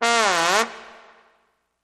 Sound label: Trombone Medium Gliss Down and Up